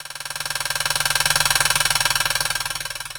ROLL, Drum & Bass, 150 BPM; Ueberschall; Drum & Bass Resonance

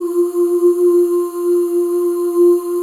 F3 FEM OOS

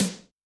Gate, Yamaha DTXpress-II snare

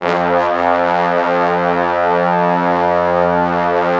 Orchestral Brass; Best Service ProSamples vol.20 - Orchestral Brass; AKAI

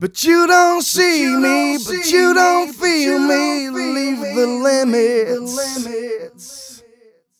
130 mel g, Vocals

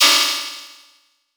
hat or crash

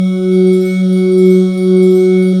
SYN, Roland JD 800

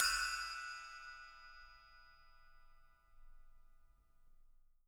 6 SPL 2, FX CYMBALS, Living Drums; Sampleheads; Peter Erskine Living Drums

